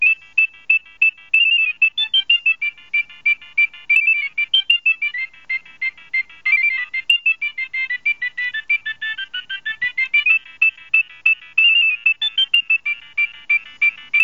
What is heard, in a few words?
nokia3510i carnival2 ringtone